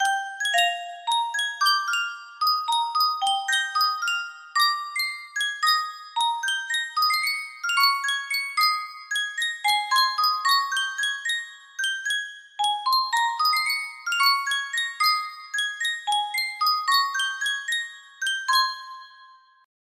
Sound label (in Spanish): Yunsheng caja de musica, music box melody